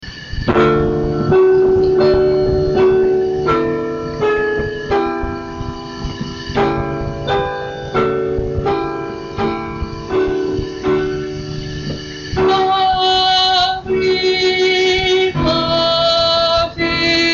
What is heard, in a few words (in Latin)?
Ave Verum Altos; musica sacra